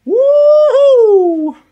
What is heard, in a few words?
woohoo